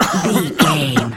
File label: voice male coughing